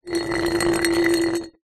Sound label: household glass jar containing water slide concrete; zapsplat